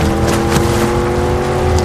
engine stall; Waterraft

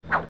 whish; Incredible-Ninja

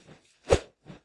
swoosh; Ogre-Defense

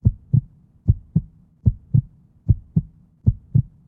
heart beating; Maze-Lover

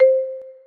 ui tap; Maze-Lover

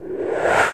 woosh up down; Knights-Diamond

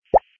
bubble; frog super bubbles

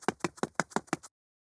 character footsteps while moving; Maze-Lover

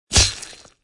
slash; Ogre-Defense

